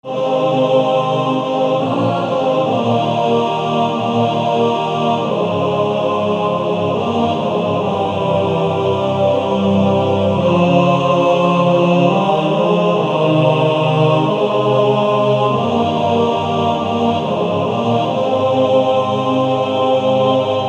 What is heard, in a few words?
Male Choir TTBB